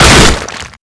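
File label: impact stone pillar close; Explosions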